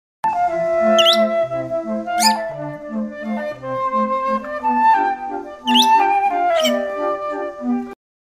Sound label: shima enaga